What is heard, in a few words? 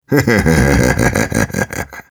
Engineer laugh evil, ru